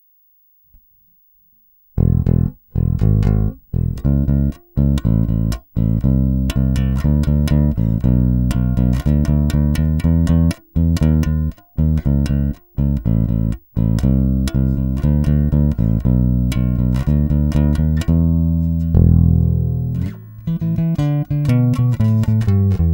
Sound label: Fingers